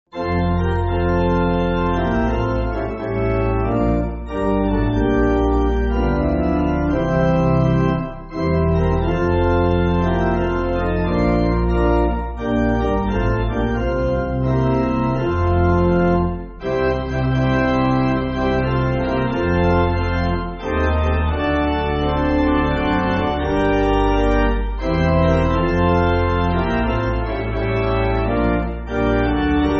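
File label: Pipe, Music Backing Files for Churches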